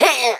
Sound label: Pecheneg Rogue Damage